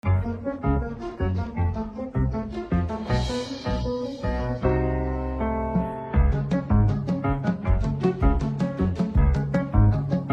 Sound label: Be Merry Instrumental